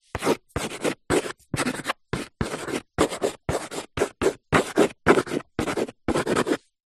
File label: chalk writing sentence on childs blackboard